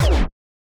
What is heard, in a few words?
charge; CrossCode; battle